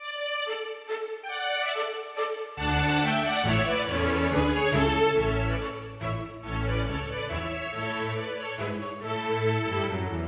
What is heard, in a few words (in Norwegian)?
Menuet for strykekvartett orkester m.bass; Aamodt